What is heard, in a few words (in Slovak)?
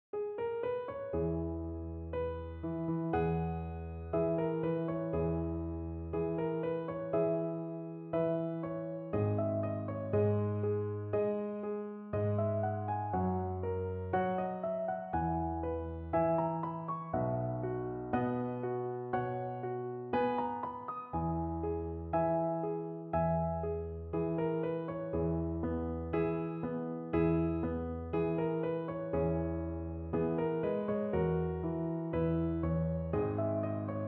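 perinbaba piano, Romantická skladba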